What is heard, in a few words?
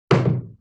Wood Hit; Vintage Cartoon